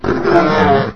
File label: worm pain; npc; svencoop; morass beta